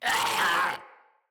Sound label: Infested Runner Attack Call